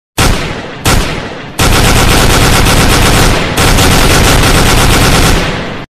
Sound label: FAMAS; اسلحه